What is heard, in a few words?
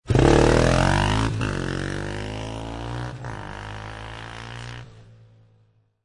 Motorcycle Dirt Bike Drive Away, Motorcycle motor